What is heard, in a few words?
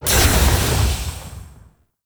Sword Heavy Attack Burst; Enemies; Weapons; DE; Warframe; Teshin